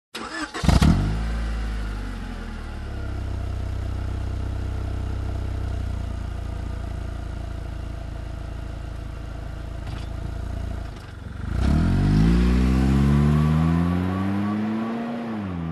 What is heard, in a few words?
Car engine ignition and drive off